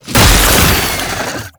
Latch Attach; Roathe Summon Gulphagor; Warframe; Tau

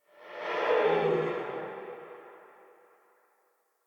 Stag Vocal Pain Long Echo; Animals

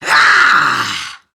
Scream; Warframe; Grineer Catbrow Handler; Battle Barks

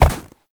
Step Snow; Warframe; Uriel; Movement

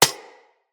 Bullet Impact Generic Metal; Weapons; Surface

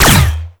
Laser Weapon; Weapons

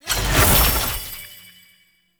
Team Buff Cast; Enemy; Sinecure; Melee Grunt Dual Agent; Abilities

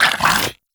Inf Vomit Gun Frenzy Activate; Infested; Weapons

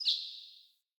Bird Single Chirp; Pothia Bird

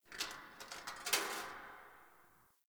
Bar Change Machine; Warframe; Nineteen Ninety Nine; Hub; Hub Pub

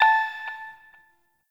hack minigame touch node; Warframe; Duviri

